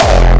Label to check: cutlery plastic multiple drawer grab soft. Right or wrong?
Wrong